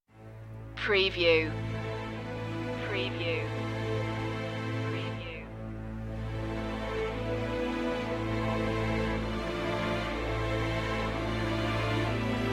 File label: strings brass